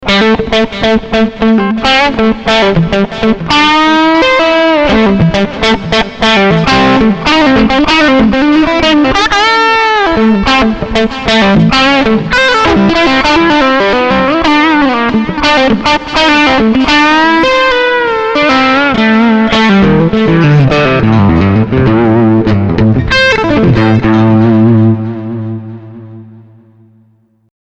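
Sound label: Vintage Crunch; Theta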